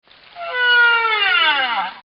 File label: right whale; A High-Tech Way To Save Whales